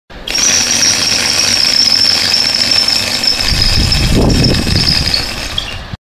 tieng hut thuoc lao rit dieu cay

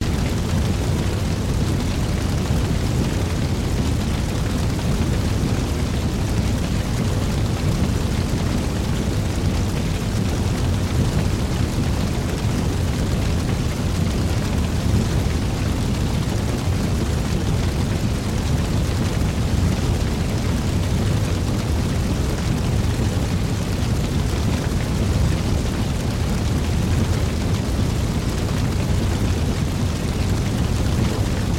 Am thanh chay nha

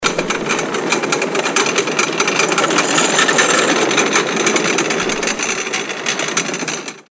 Tiếng bánh xe giường bệnh di chuyển

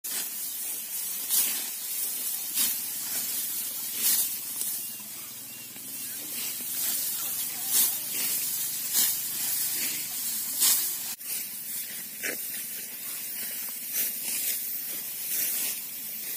tieng dung tay gat lua bang liem luoi hai ngay xua

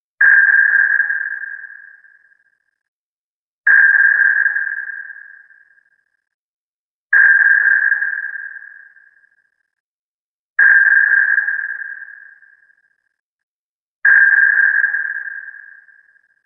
Am thanh sonar

